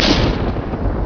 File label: PLAS EXPL; PLASMA10